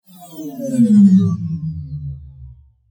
Science Fiction Spaceship Pass By Left To Right Whoosh Flange; Spacecraft; SFX Bible 6